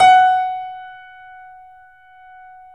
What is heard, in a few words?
Yamaha C7, 3D Pianos; E-MU Producer Series Vol. 5